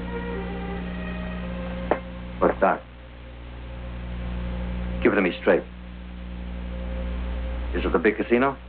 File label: casino